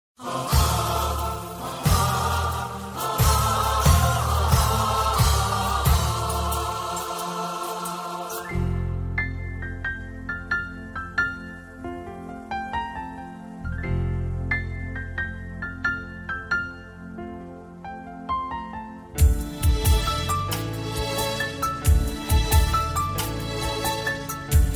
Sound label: X'an Devotional Karaoke